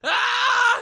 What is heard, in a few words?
space scream; astronaut